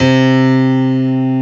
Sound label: PIANO C2; AKAI S-Series CD-ROM Sound Library VOL-8